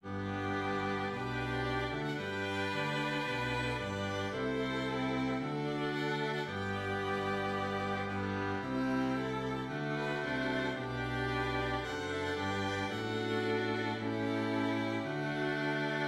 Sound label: Strings; And Can it Be (Sagina)